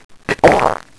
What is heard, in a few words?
fart